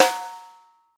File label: high quality long snare sample f sharp key